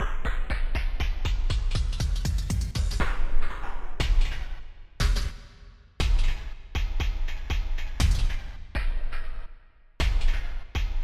drum break

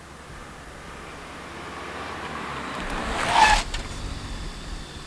squeel, cars; transpo

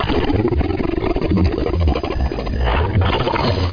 Flesh Bubble

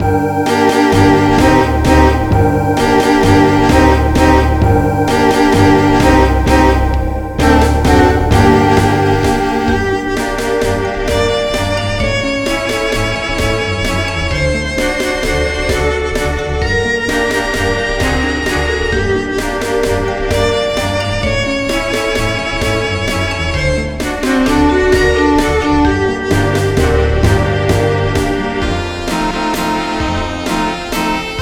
caves

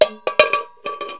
big bolt, nutsnds; Wired for Sound Pro CD; Aristosoft, Inc.

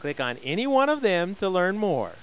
INSTRUM

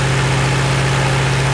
plane loop; SGI O2 Out of Box Experience 2.2

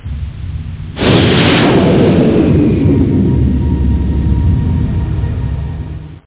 wipe; Super Games Pak (1991)(Odyssey)

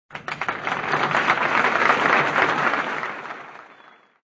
applause

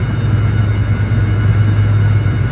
ENG RUN

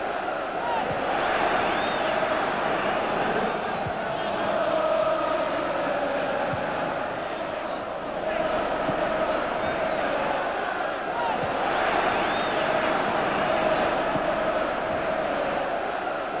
background

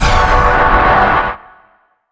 plasma resist

